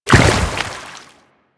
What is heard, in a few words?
Step Water, footsteps; Warframe; Grineer